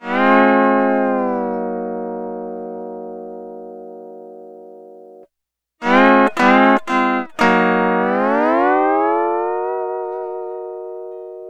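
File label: Sunrise Guitar Chord A# Dry, Lounge; Zero-G Kocktail Kollection; Construction Kitz (Lounge); Sunrise Mixes 082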